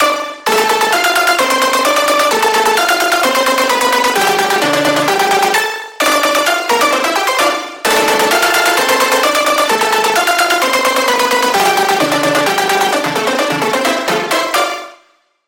Pluck; Street Fight; Routed Sound; Spire Expansion - Hyper Gamer Vol.1

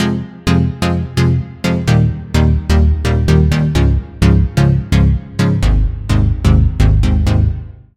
Chord, Sylenth1; Chord - Aurora Symphony; Routed Sound; Sylenth1 Expansion - Star Scavenger Vol.1

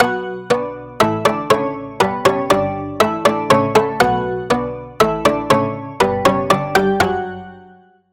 Pluck; First Sight; Routed Sound; Serum 2 Expansion; Story Walker Vol.1

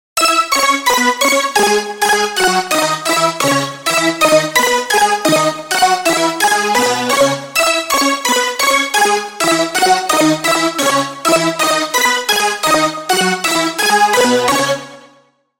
Key; Super Star; Routed Sound; Spire Expansion - Hyper Gamer Vol.1

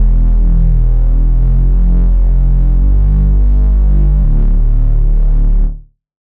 Bass, Serum 2; Bass - Ultimate War; Routed Sound; Serum 2 Expansion - Flame Summoner Vol.1

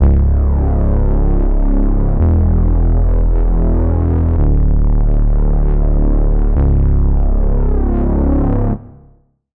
Reese; Routed Sound; Sylenth1 Expansion - Noble Gangster Vol.1

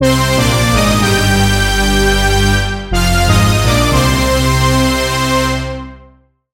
Lead; Routed Sound; Sylenth1 Expansion - Reality Maker Vol.1

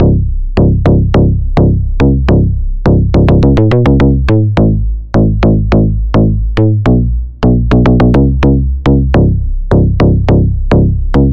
Bass; Criminal Enterprise; Routed Sound; Serum 2 Expansion - Noble Gangster Vol.1

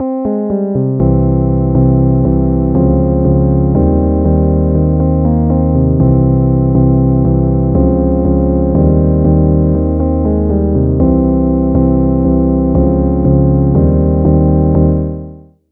Key; Key - Right Place; Routed Sound

